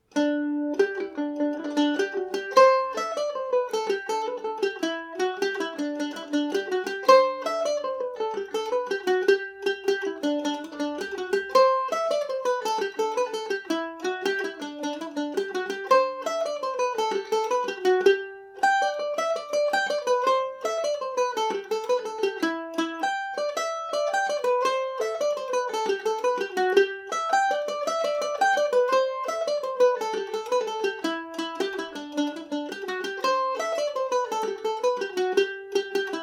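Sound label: G major, Irish mandolin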